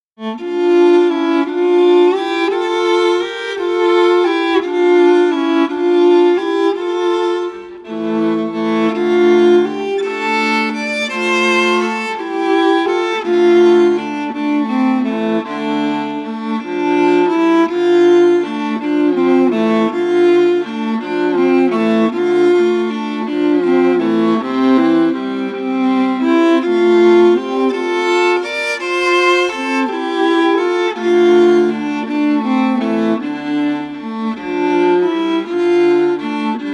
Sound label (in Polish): Altówka